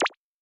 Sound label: Show Award Bubble; boomerang fu